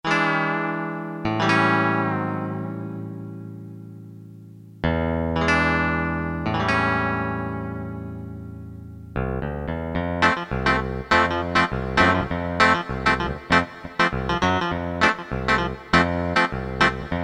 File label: E. GUITAR, Korg DSS-1